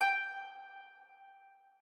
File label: harp